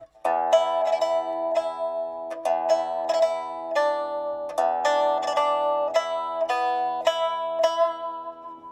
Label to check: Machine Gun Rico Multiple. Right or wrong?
Wrong